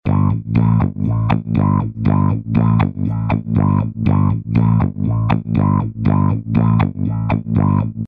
Bass mit Effekt; Le Frog

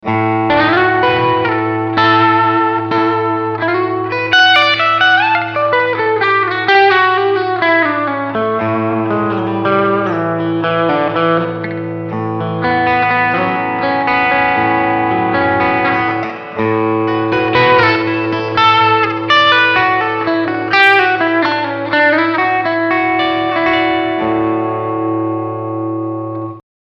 Clean Picking Steg HB

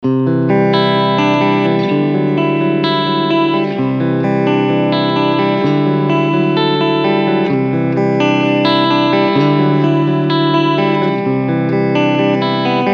Clean Picking beide SC

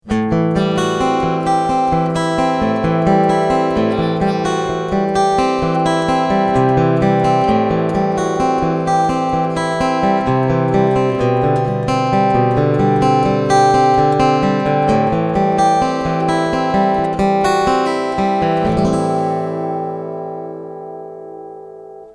eq middle mic beide

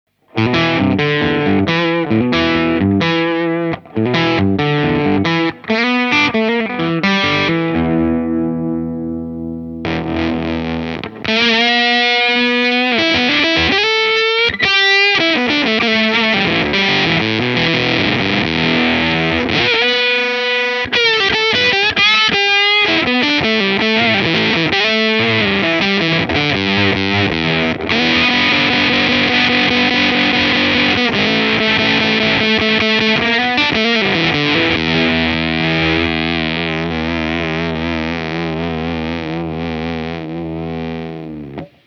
British Pedal Company Pepbox Amp weniger Zerre EFFECT max

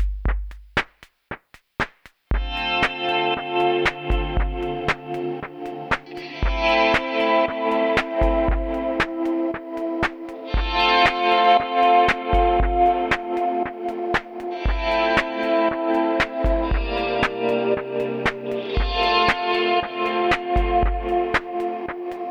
Snare Trap mit Kemper Swells Plus LFO

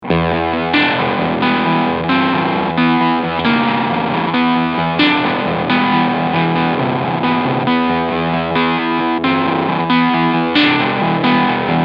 MXR Octavio Fuzz Pedal Medium Fuzz OHNE Amp Gain

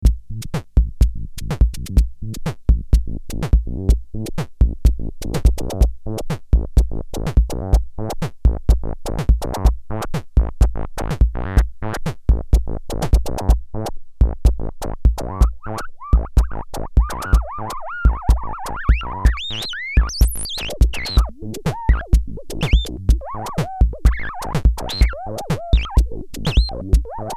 Summit Audio TLA 100A Mix Bus Attack Slow Release Fast max. Reduktion 2 dB

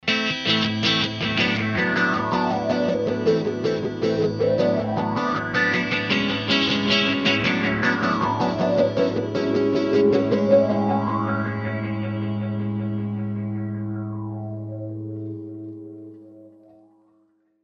boss gigcaster 5 gitarre filter delay

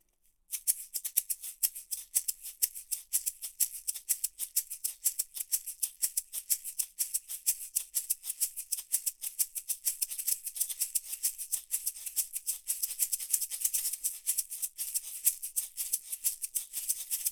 Doppel Shaker Polyrhythmus fern

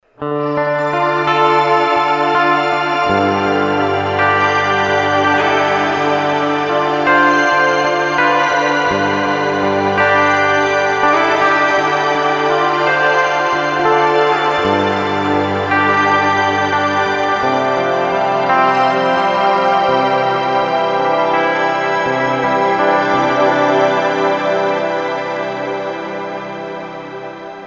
Modus Shimmer

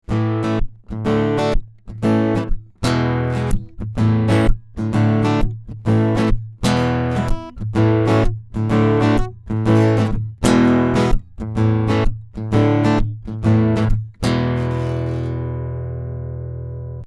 Akku Git FET

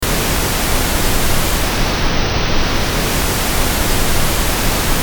rauschen

